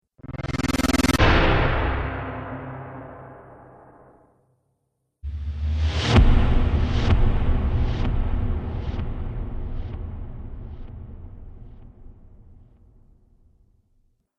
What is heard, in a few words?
Trailer Hits Twin Pack; Miscellaneous Impact Sound Effects